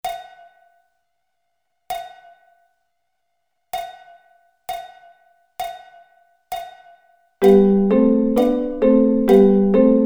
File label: G Double Stops